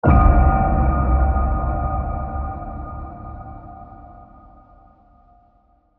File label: Piano Ping